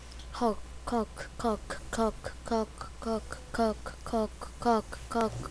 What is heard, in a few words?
cough